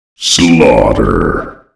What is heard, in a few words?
SKAARJ slaughter